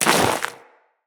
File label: Crewman Step Ice, Footsteps; Corpus